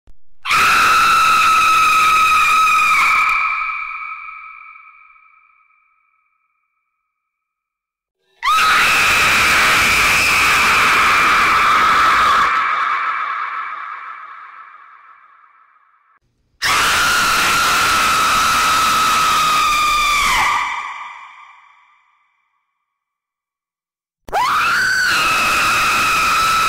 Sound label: scary sound effetti sonori urla spaventose bhoot sound scream scary effects